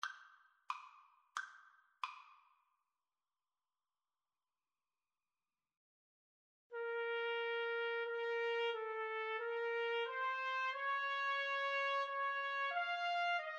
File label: capriol pavane 2 trumpet